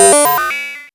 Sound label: MOOG HAT; AMG - Vince Clarke Lucky Bastard